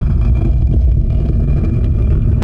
door stone move; movers

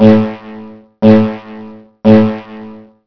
event siren

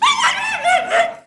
poodle; langeles

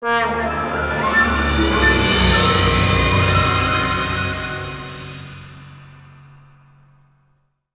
Eerie Event, music; Mood Eerie

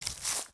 FS Weeds, footsteps

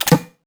reload m79; weapons; Max Payne

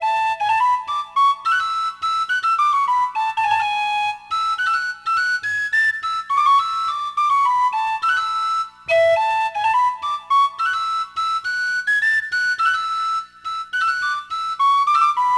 township wright; Sid Meiers Pirates; XBOX

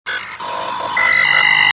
console, ambience; kejim

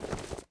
body Get Up wood

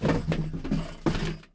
move, tavern; AMBIENT; Pirates Of The Caribbean

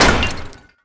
destroy pillar anchor; Tony Hawk's Project 8; XBOX; crete park

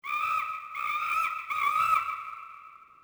monkies, colombia; ambience; Soldier Of Fortune II Double Helix; XBOX

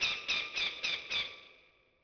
hawk; Ambient; MX vs ATV Unleashed

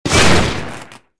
explo; Star Wars Jedi Knight II Jedi Outcast; XBOX

